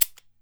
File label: cabasa; E-MU Producer Series Vol. 7 – Old World Instruments